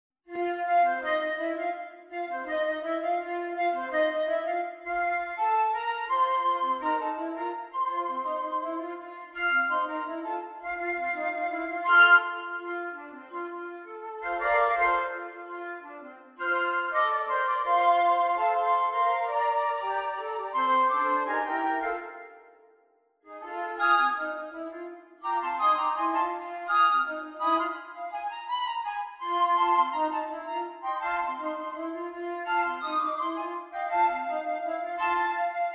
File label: flute